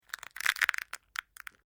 DRY BRITTLE SNAPPING; Various Organic Foley sounds

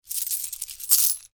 money coins in hand movement; foley; Objects; McKinney Sound

